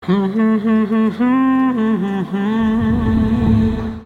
Humming

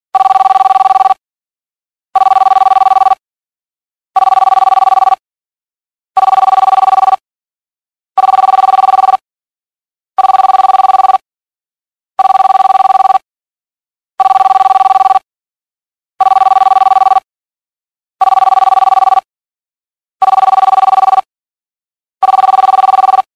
Normal Ringtone

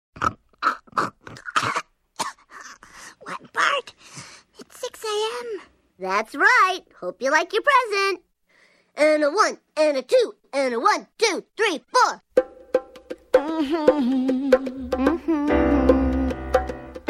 Birthday Song

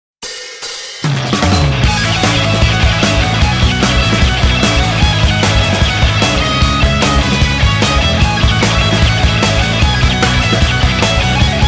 Guitarsolo

